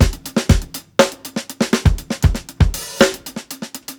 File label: 120 HALF TIME BEAT, DRUMS LOOPS; BASEMENT DRUMS VOL 2